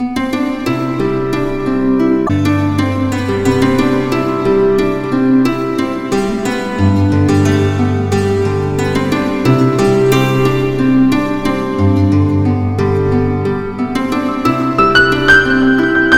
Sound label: viola